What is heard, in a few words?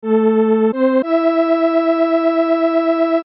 leslie